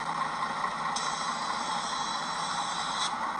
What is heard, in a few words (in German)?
Bus zischen